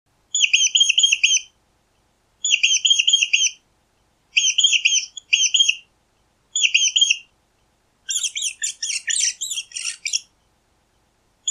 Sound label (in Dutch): muisgepiep